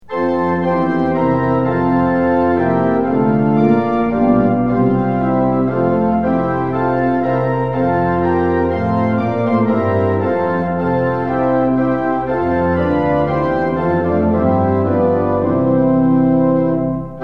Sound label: tune; hymns; lillenas